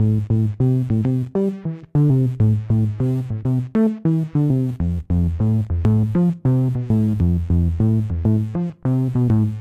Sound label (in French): Sound Prom Basse; Remember Me